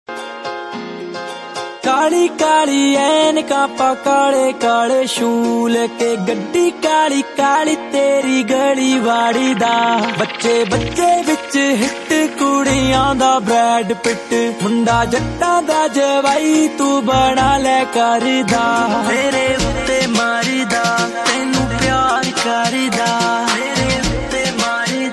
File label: punjabi ringtones